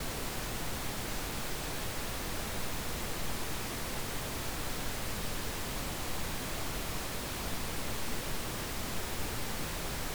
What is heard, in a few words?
pink noise